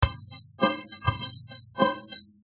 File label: morph